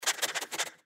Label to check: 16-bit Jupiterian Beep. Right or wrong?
Wrong